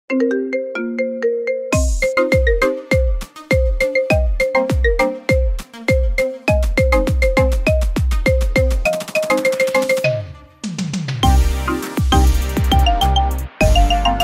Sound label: Never Ever Marimba